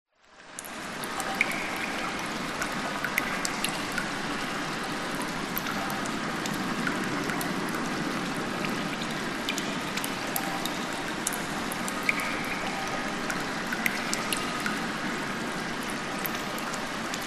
nature cave ambience water running drips wind outside